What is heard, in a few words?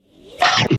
Skill Strong Terra Strike